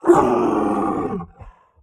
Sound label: monster pain death; sonniss gdc